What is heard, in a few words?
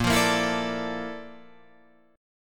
Bb Major Sharp 11th Bb down Guitar Drop D, chord